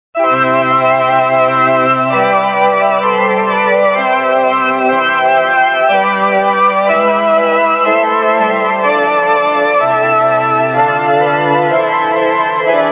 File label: sk10 organ